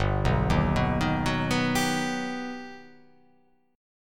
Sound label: G Augmented Major 7th